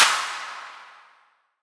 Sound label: Soft Noise Clap